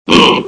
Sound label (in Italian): verso cinghiale